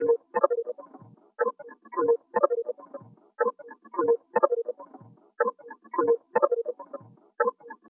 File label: Chapli Drum, Electronic Beats; Sonic Foundry (Sony Creative Software); Myths of Technology